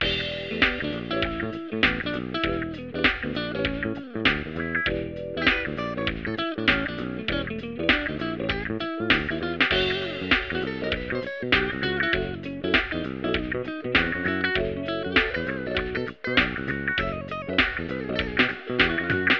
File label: Jazz; alien earth